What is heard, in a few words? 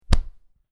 Hit Punch Stomach, Muted thump or thud as fist hits stomach; or other body part; Various Impacts; Hit Sound Effects